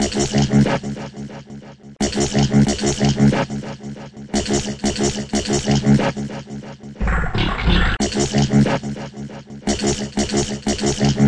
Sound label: synth indigestion; SYNTH AND ELECTRONIC